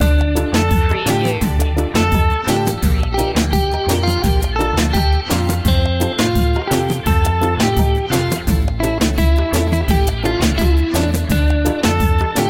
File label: latin caribbean reggaeton loop